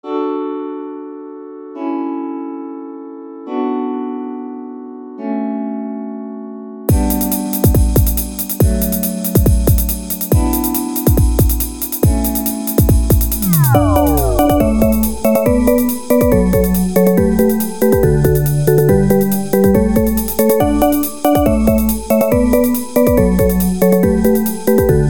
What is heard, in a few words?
Pachelbel Canon remix